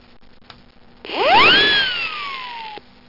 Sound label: wizzz